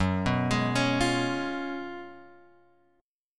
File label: F sharp Major 7th Suspended 2nd Suspended 4th; F#M7sus2sus4 Chord {2 2 X 1 2 1} arpeggiated